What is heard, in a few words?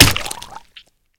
Flesh Head, Gibs; zps